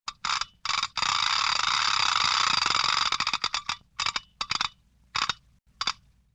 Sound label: Toy Ratchet Noisemaker; Vintage Cartoon; Foley